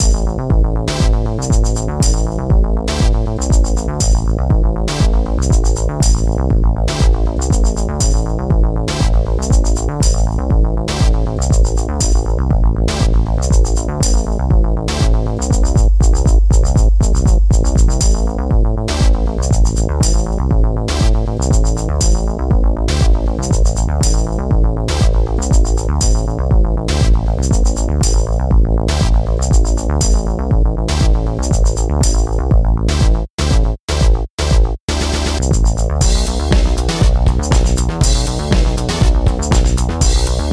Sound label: Conversation; Rodea the Sky Soldier; Wii